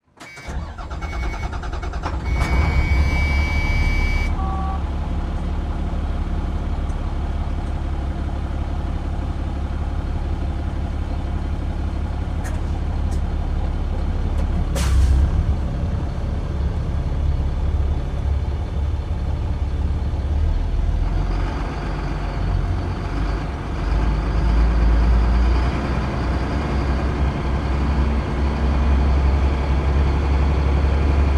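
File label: school bus away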